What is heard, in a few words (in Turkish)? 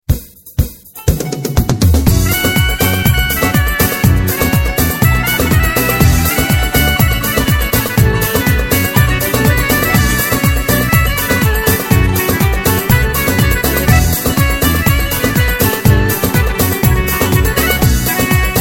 sazssiz